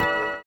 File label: orient, bizarre guitar; Spectrasonics